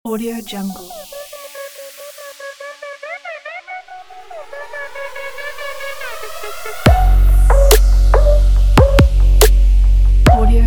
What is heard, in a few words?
trap agressive